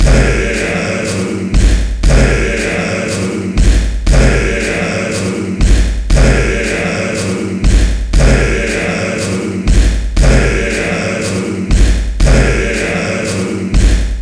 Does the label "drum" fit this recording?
Yes